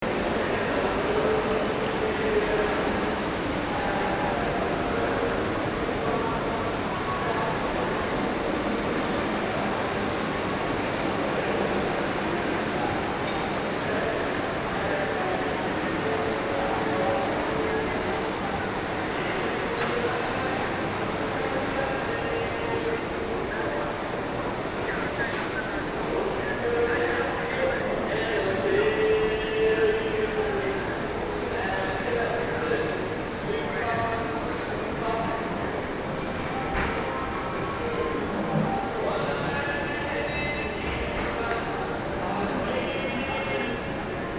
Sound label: mall soundscape